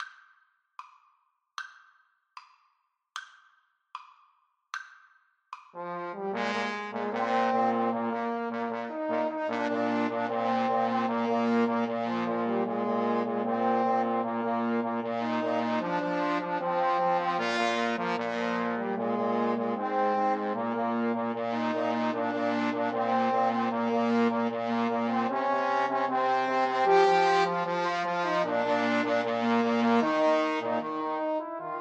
trombone trio

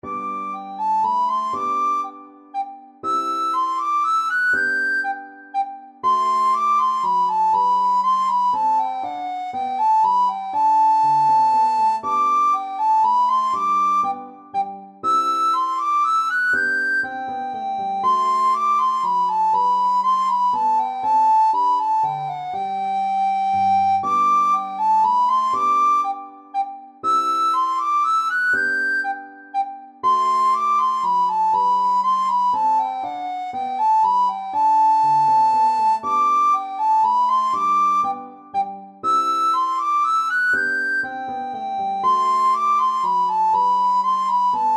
minuet, recorder; bach

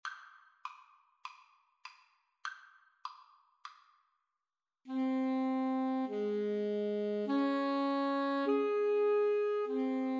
loch lomond, Clarinet-Saxophone Duet

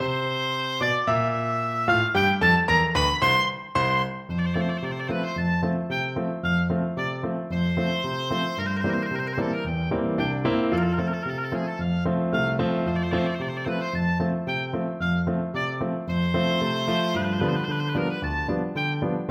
oboe

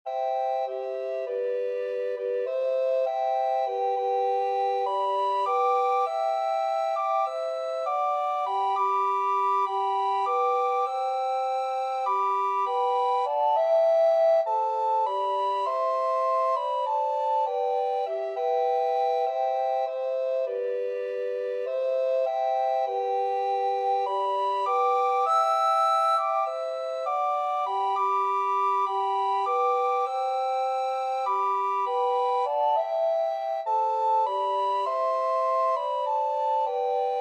mountain thyme, recorder ensemble

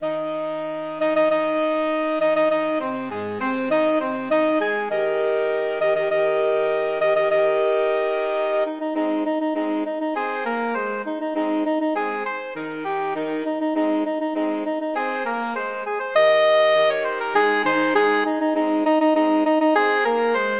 william tell SAX quart

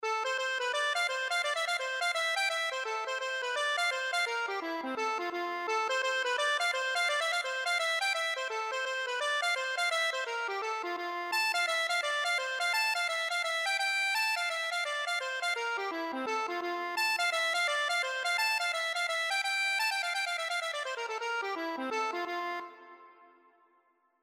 accordion

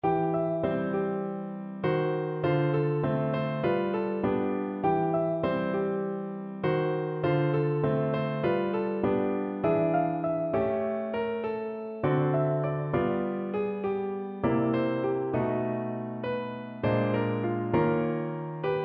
ragtime piano

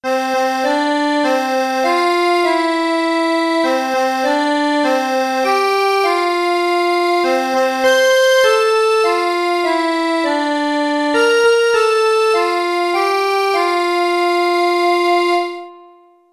happy birthday easy, accordion

